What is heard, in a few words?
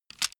bamboo pick up